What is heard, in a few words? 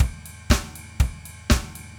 Basic 4 Ride Drums; Dr. Fink's Funk Factory